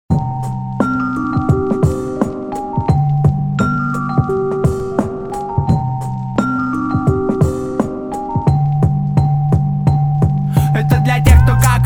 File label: hip hop